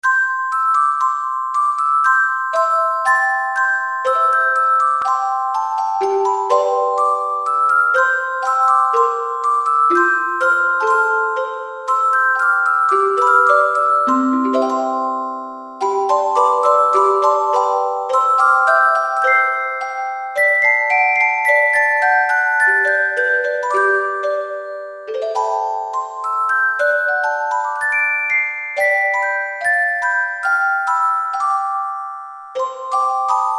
Celesta; Kurzweil K2000 Orchestral ROM

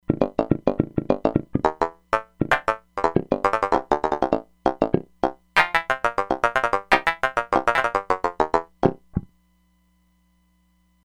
Juicy Slap, E-mu Proteus-3; Factory patches

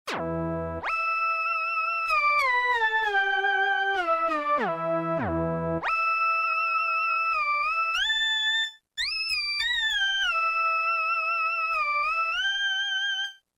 lead; Roland M-DC1

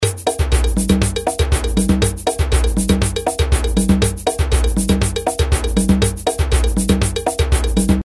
SAMBA, Roland R-8

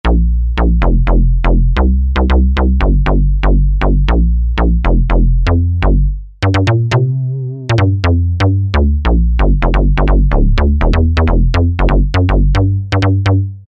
Metalrezo Bs; Dance; Roland SR-JV80-06

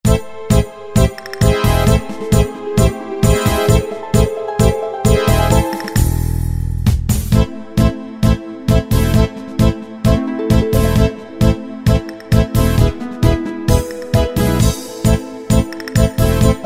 Tango, Yamaha QY70; Preset Styles

